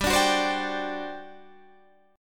G Major 7th Suspended 4th, G, down; GM7sus4; strummed

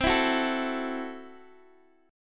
C 6th Flat 5th C down Guitar DADGAD

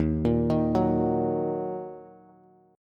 D sharp Minor D sharp, Bass; D#m arpeggiated; 11,13,13,11

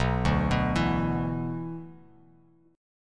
B-Minor Double Flat 5th B down, Bass Standard; Bmbb5 arpeggiated; JGuitar

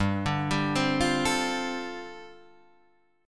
G 7th Suspended 2nd Suspended 4th, Guitar DADGAD

